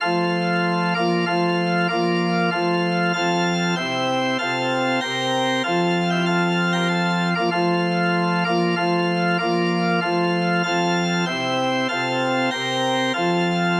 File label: organ